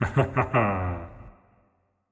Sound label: Laugh; Marv